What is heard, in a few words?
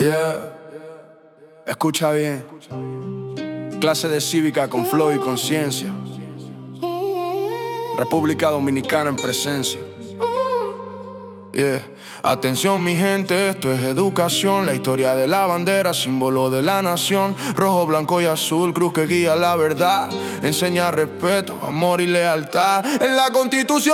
Rap; La bandera; la bandera y su historia version rap